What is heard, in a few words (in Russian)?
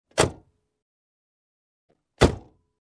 hlopok dverju avtomobilja